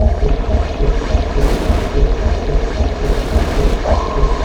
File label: Strobo